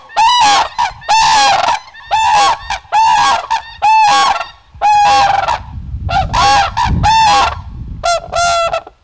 Unison Red Crowned Pair, crane

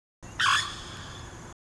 Alarm Wattled, crane

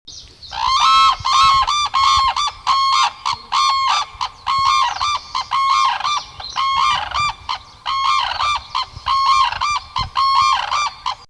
red crowned unison; ringtone

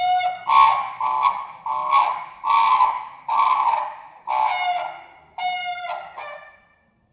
Red Crowned Crane Alarm

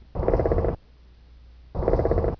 Sandhill Crane Contact Call

